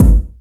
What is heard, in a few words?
BD, Yamaha RY-10, Drums